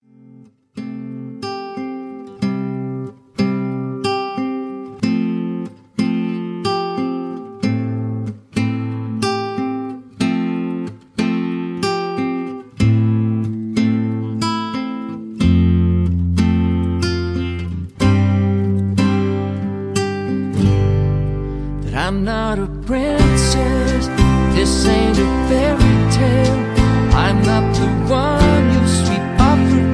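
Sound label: Studio Version, Karaoke Backing Track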